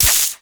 Shock Orb Beam Hit; Warframe; Eximus

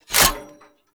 Rocket Launcher Reload; Warframe; Weapons; Tenno; Lasrian

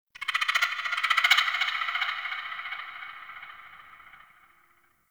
Creature Distant Mid; Ambience; Misc Outdoor; Warframe; Infested Eris

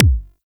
SMG Punch, Weapons; Warframe; Tenno; Protea

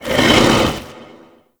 Brawler Rock Elemental Attack Vocal; Warframe; Warframes

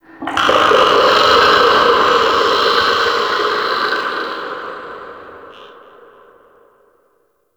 Shipyards Dist Eerie One Off, Ambience; Warframe; Grineer Shipyards

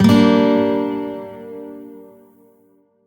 Shawzin Min Chord H; Music; Warframe; Lotus Shawzin

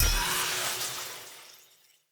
Player Short Sword Recall Button Push; Weapons; Swords

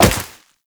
Wall Jump Snow; Footsteps; WallRun; Warframe; Characters; Tenno

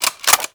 Reload, Freeze Ray; Warframe; Corpus; Tennet Glaxion

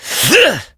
Adult Operator Melee Swing Exert; New War

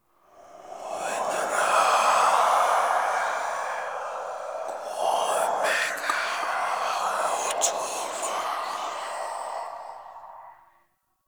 Whisper Blood Enemy; Warframe; Ambience; Entrati

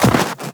Run Snow, Footsteps; Warframe; Tenno

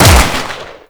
Grineer Lever Action Rifle Shot; Weapons

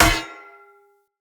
Polearm Medium Impact Block Defender; Weapon Impacts; Combat

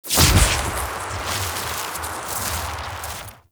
Overhead Long Smash Attack Loop; Enemies; Warframe; Grineer Nightwatch

